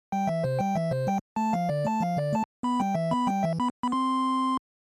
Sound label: Retro 16 bit or 8 bit success melody win victory